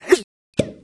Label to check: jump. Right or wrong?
Right